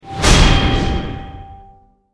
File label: spell damage mirror; fighter